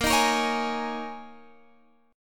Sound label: Ab Suspended 2nd Suspended 4th Bb